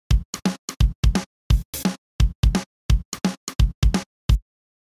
Sidechain Gate bearbeitet Drums

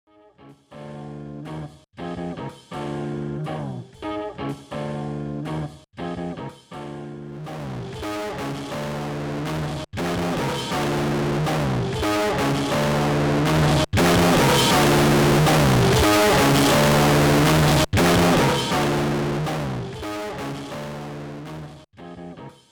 Gitarre Input Drive Distortion Output Drive dann alle drei Zusammen und Cutoff

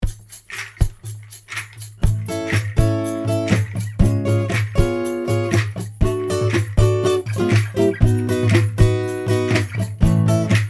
Akustiksession; AEA R88A

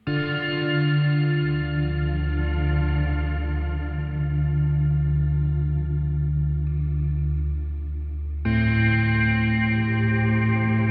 Meris Mercury X Cathedral mit Modulation

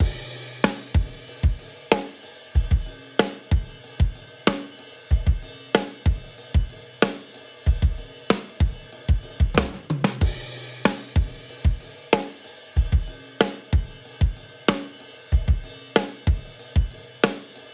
Akustik Set VSC 3 4 1 Ratio 30ms Attack 01ms Release 4 dB GR Peak Stereo SC SC Filter 90Hz

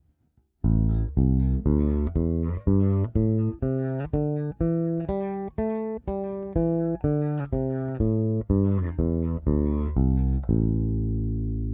Aguilar Super Double vs. Nordstrand Big Blade Bridge PU aktiv

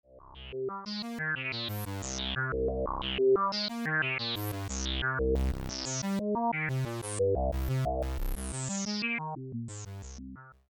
Resonanz

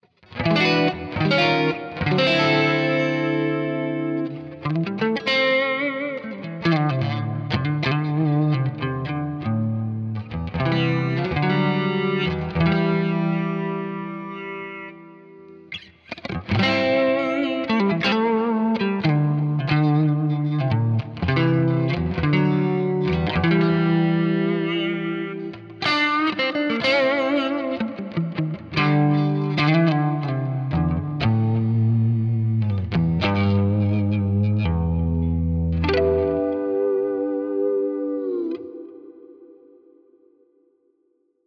Blackstar Polar 2 Clean ab 20 Sek Enhance Modus

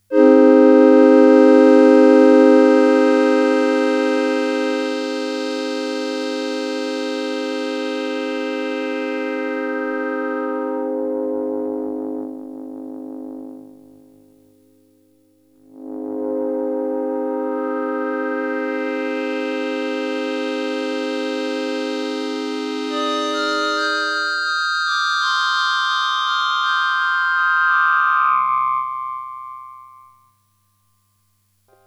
Casio MT 400V Organ Filter Sweep und Waw

